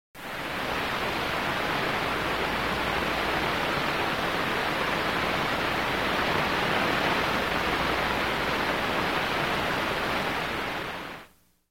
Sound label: Galactic Background Noise